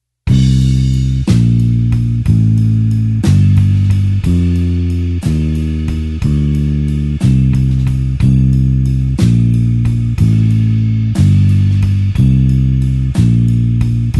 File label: blues bassline slow C